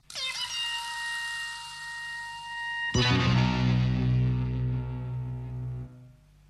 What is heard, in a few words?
title card jingle; mobile suit gundam